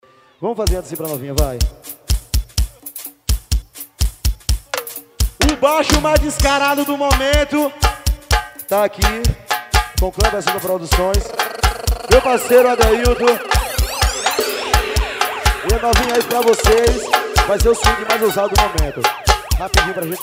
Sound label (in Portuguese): groove, ao vivo; swing mil grau; porto de pedras